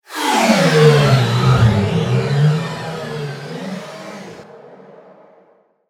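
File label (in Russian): свист гоночного автомобиля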